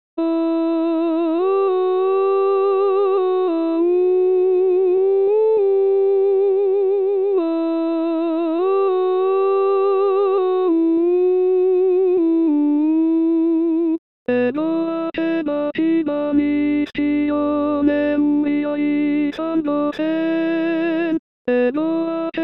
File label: Alto